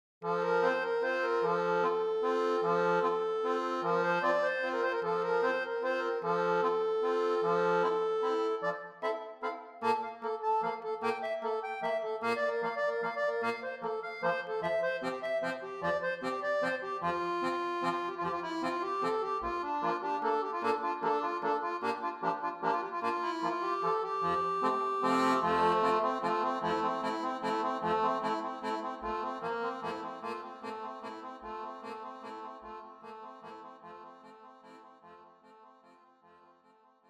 Accordion only

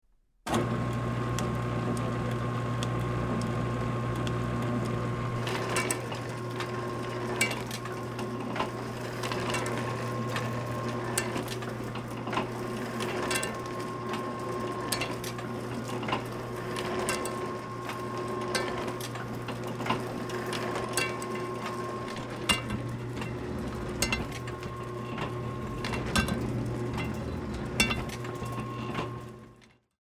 Chandler Price 10X15 Sounds

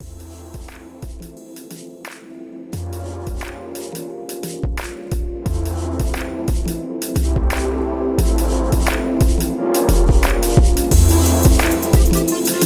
Chill, 88 BPM

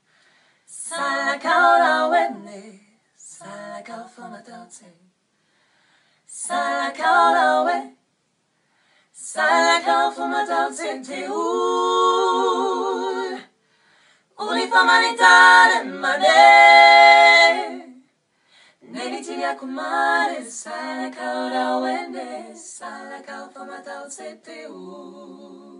GOSPEL

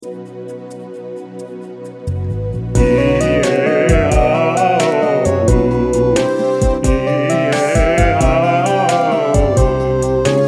Male voice Ee Eh Ah Oh Uu

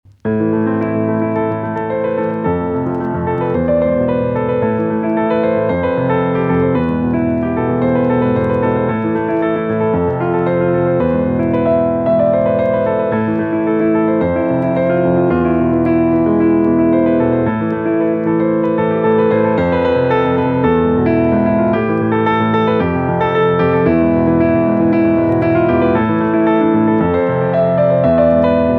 micropiano

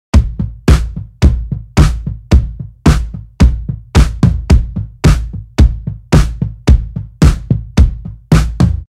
110 BLACK KIT DRUM LOOP

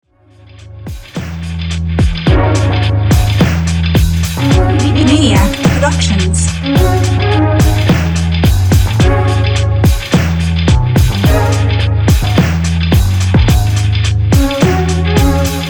Mysterious Bass

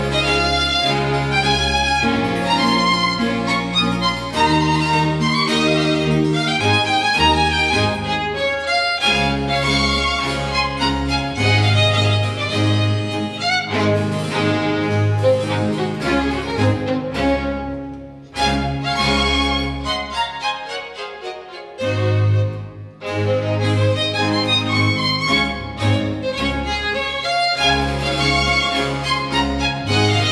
Slow Fast

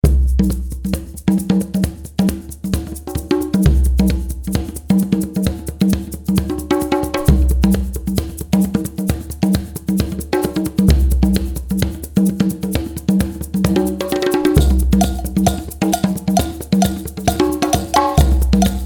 Conga Groove

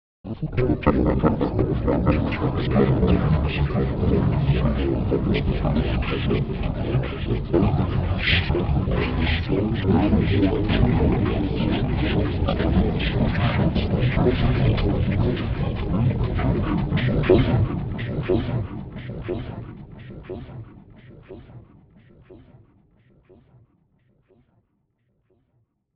DARK VOICES

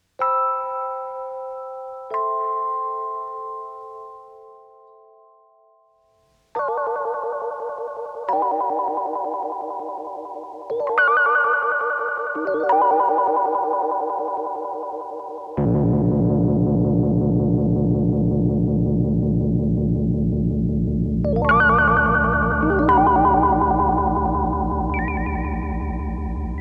Fender Rhodes; EQD; Square & Ramp

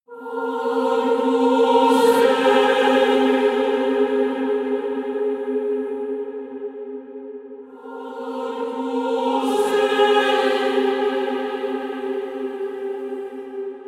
Agnus Dei Lord's Prayer Instrumental

